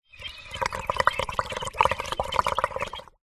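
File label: household water in metal pot bubbles surface; zapsplat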